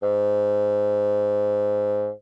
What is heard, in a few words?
Bassoon ff Ab2; Woodwinds